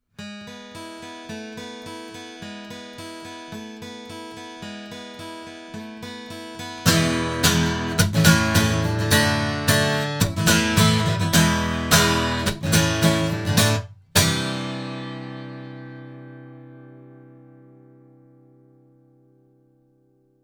Sontronics Orpheus 8 guitar, microphones recordings